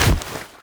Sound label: knife push attack heavy; weapons; Counter Strike Model Pack; CS2